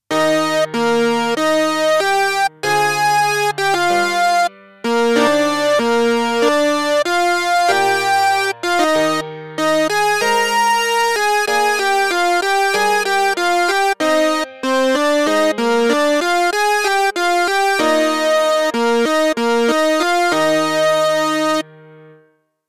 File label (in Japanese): コード進行音源; メロディと編曲の関係性